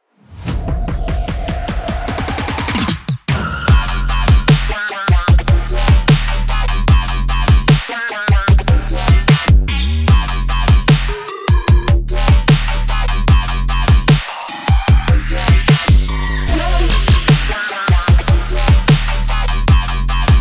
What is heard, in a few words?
dubstep music hype hipster opener background intro